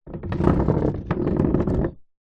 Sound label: household chair plastic drag wood balcony